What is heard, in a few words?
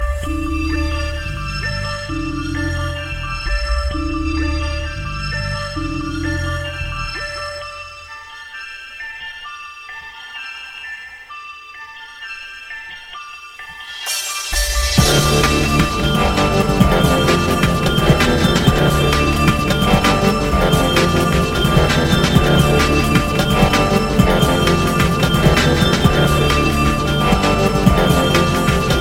Gun Fly Mix; Vertu